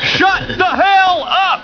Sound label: buzz